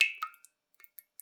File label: Drips